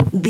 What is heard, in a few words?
footstep wood walk